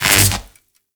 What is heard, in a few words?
Mining Laser Fire Stop; Eidolon Plains; Eidolon Mining Lasers; Weapons